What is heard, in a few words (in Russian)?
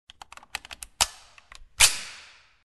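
заряд винтовки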